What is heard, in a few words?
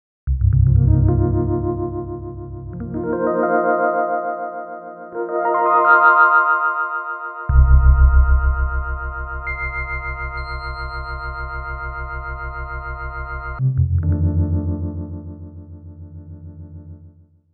rhodes; sonic charge; synplant